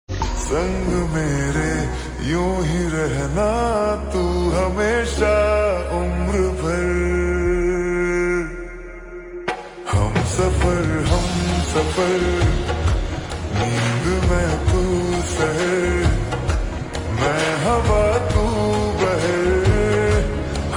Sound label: Slow Reverb Version Ringtone